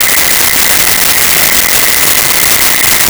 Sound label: Alien Locusts Loop, Science Fiction